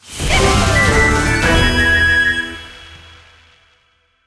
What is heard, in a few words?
character Default Win; finn